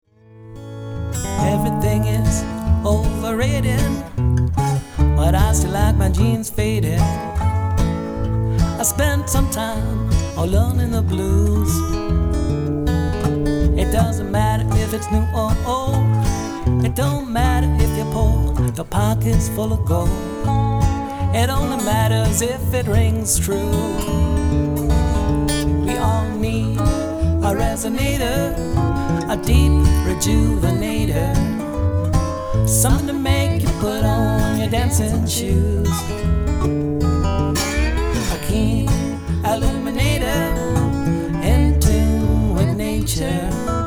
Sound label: Resonator